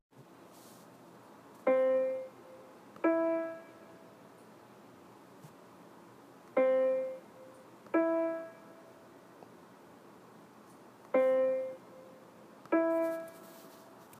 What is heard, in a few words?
Interval